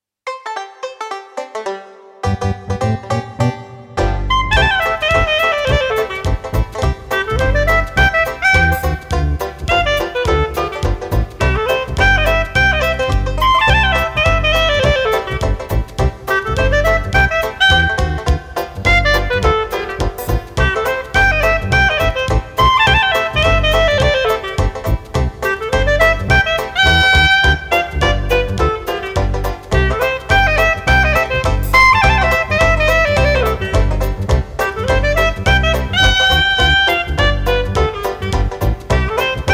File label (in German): Klarinette